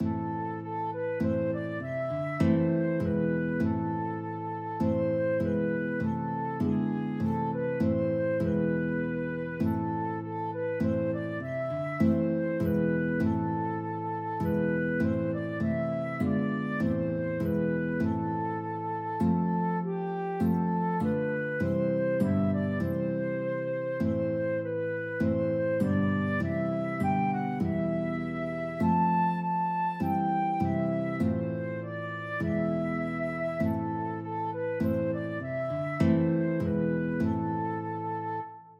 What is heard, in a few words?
guitar flute duet; aberystwyth